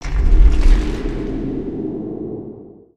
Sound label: distant objects rattling; ninjago